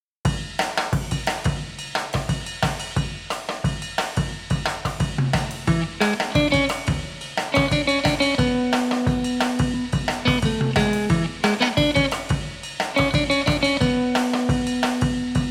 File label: Underscore, The Swinging 60s, frantic; Narrative Mind